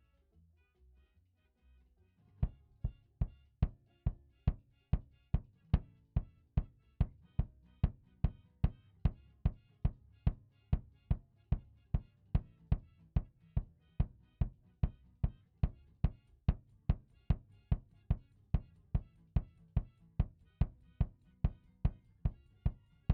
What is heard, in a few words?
Kick